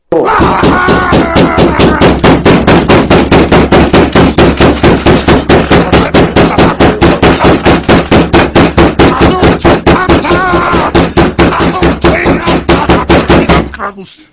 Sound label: heavy yell